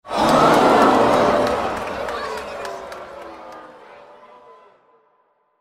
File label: crowd missed; Football! LuckyTap; Eyecon